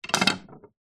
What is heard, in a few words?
sport pool snooker cue lay down wood surface